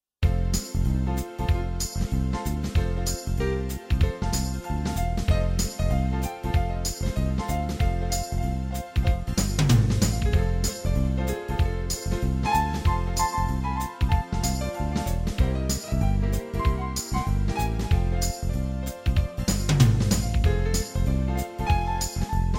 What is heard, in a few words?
Lite Swing